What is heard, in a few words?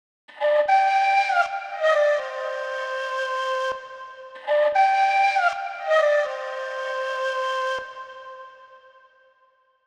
118 BPM G Min Quena, melody loop stem; Trap; Loop Stems; Cymatics; 2022 Melody Collection; Trap Pt 2; Panama